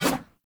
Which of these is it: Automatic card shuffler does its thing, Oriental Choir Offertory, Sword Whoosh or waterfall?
Sword Whoosh